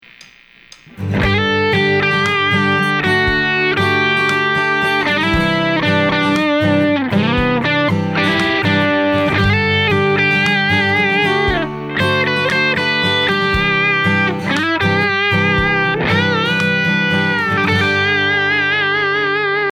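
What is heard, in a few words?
Cubase electric guitar solo; ereki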